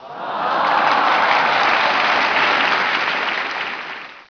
Theatre; Caesar3

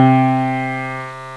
piano

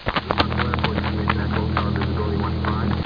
bat taxi; austrlia

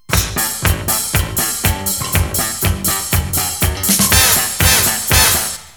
FUNK; L'Effet Pommier - Volume 04; MUSIC

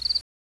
Cricket; Koji the Frog 2.0.1

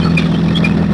tank loop; TeamAlligator

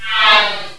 Planet bonus; Prometheus 1.0

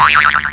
sproing; PUZMANIA

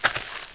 gravel shuffle; Player; Heretic II Demo

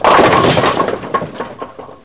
STRIKE; PC Gamer 4.2; mtm2demo

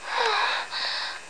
MOAN

